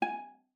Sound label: VIZP G#5, VIOLA PIZZ; Xsample VOL-5